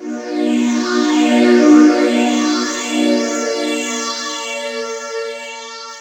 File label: Rollright Stone A, Pads; Zero-G; Total Drum Bass